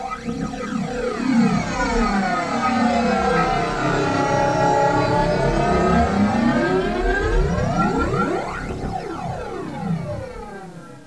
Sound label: Pad; Great Eagle 2